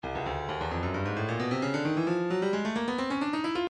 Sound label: breakbeat 132 bpm; Stylus Breakbeat Midi Files 130 139 Bpm